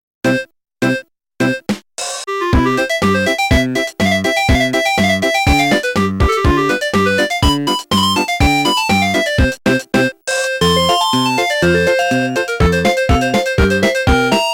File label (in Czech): melodie